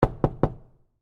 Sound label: Door Knock Exit, Foley, Door, Knock, Exit, Glass, Building, Light, Taps; SoundEffects-BlastWave